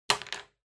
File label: Lighter Put